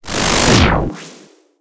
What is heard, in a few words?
Collapse; battle anims; pokerogue